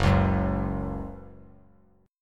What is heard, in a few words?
Gb 5th Gb down; Gb5 strummed; JGuitar